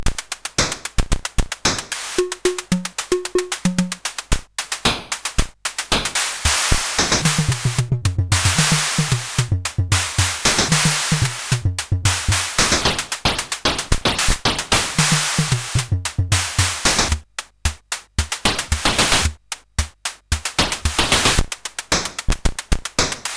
sid CSG8580R5

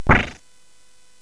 SFX; Narco Police; hvsc

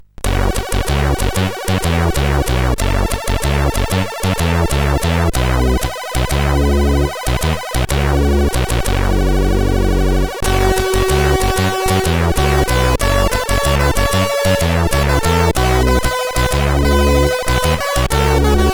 tune sid CSG8580R5; Underground 08 tune 1

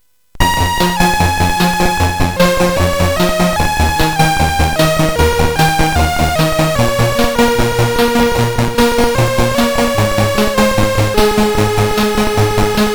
Synth Sample III, CSG8580R5; hvsc

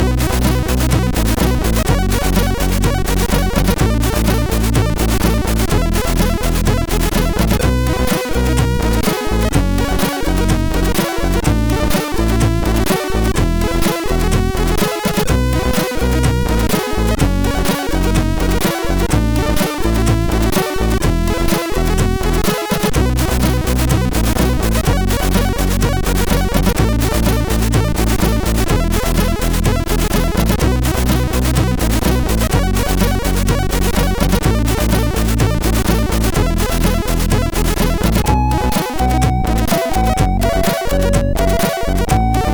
Rakel Intromuzak, MOS6581R4; hvsc

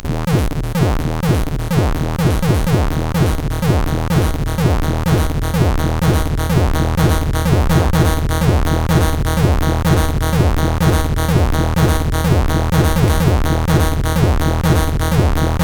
sid CSG8580; Beserk Trip; hvsc

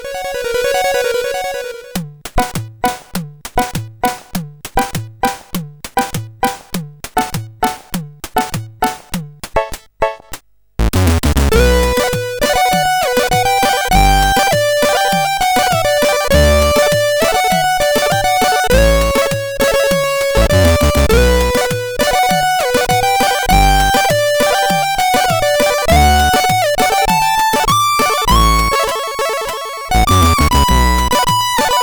tune, CSG8580R5

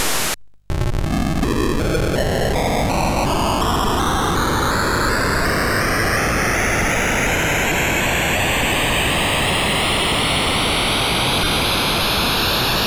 Moon Hop, sid, MOS6581R3

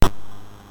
sid MOS6581R2, GAMES; Face Off

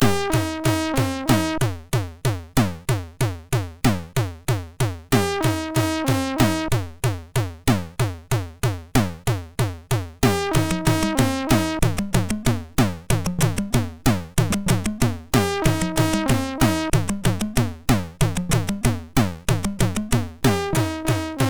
Indian Invasion, MOS6581R3

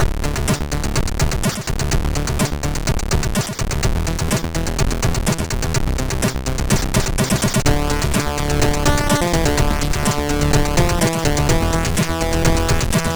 MOS6581R3 sid; Dethrone; Dexter

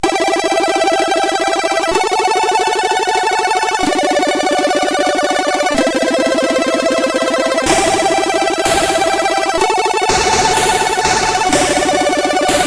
Techno, CSG8580R5

